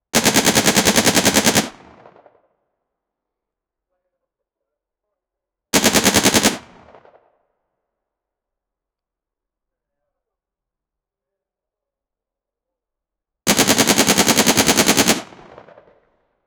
machine gun fire KPV 145, automatic

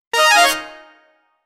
windows background wind

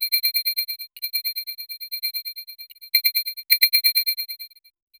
clean soft bright ringtone

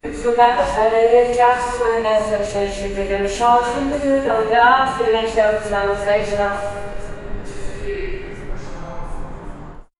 hospital

